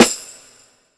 Lo-Fi Big Perc; LIFEFORMS

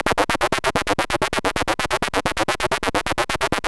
Sh101 Loop, 130BPM; musicradar; bitcrushed; Crushed Loops

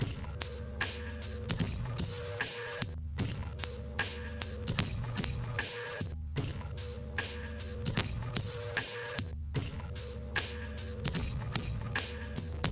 stretch, breakcore breaks

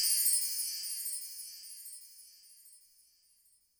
Wind Chime, YAMAHA RM1X

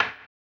HAMBONE, Vocal Blues Soul; Spectrasonics; Planet

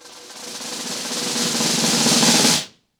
Snare FX 90BPM, ACOUSTIC DRUMS; Sonokinetic - The Roots Drumloops

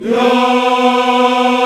VOX African Yas; Roland LCDP11 Africa VOL-1

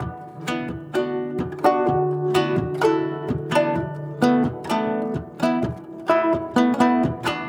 Guzheng Poly-Rhythm; Continental Drift Aftershocks

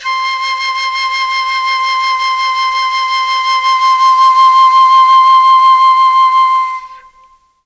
C4 CH FLT, Mellotron, CHMBLN FLUTE; Mike Pinder - Mellotron Archive CD

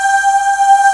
VOX C4; AKAI S6000 CD-ROM - Volume 1; SAMPLE WAVE MIXING 1; 128 PRO SYN

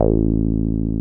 303 OW A2P, 303 OW BS; Drums In Your FACE!

